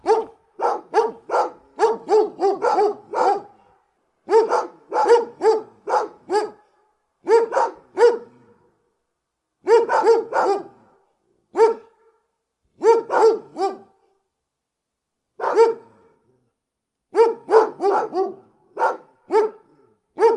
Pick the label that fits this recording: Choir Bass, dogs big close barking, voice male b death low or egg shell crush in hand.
dogs big close barking